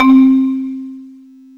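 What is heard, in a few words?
MARIM, percussion; AKAI S-Series CD-ROM Sound Library VOL-8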